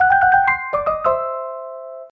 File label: Meal Prepared